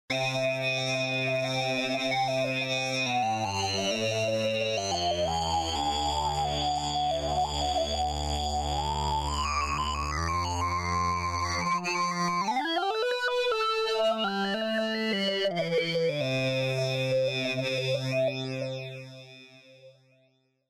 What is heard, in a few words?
Nord Voco Lead